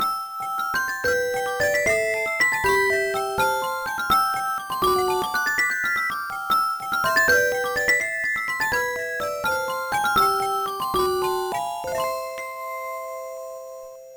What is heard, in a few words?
carillon; Dizzy; Trackers; Modland